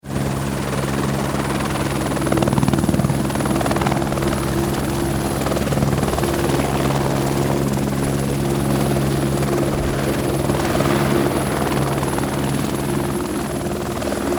Helicopter Hover, A well defined helicopter flight recording; Helicopter flying, hovering; Helicopter Sound Effects